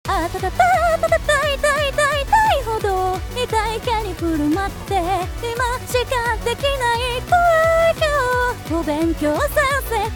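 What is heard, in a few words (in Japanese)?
Synthesizer V, サビ, ダブリング適用前, 歌声; Synthesizer V Studio 2 Pro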